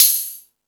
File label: High Hat, Drum Machines E-MU Pro-Cussion